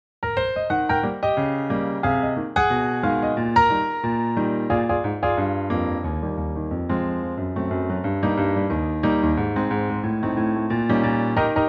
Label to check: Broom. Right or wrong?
Wrong